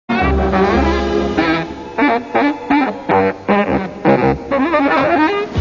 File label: solo